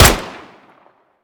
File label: shoot, hk45; tacz; daffas arsenal